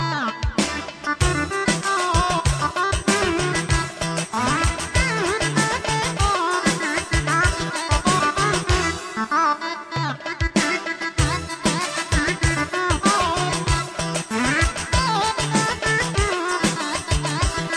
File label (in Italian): Solo Armonio